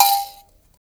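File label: VIB KALIMBA G#5; Heart of Africa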